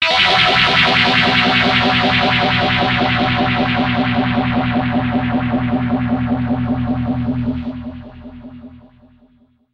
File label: dirty gtr pigtronix